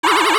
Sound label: Mirror Shield Reflect Ice Start; Zelda; OOT